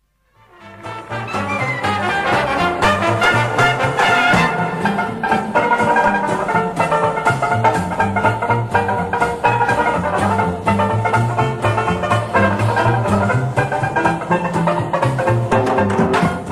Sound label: Banjo